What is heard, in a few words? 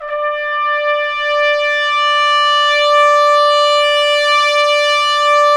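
BRS TP SWE, Trumpets Swells; Roland L-CD702; Stabs-Swells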